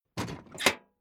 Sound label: Metal Tool Box Closed Metal Rattling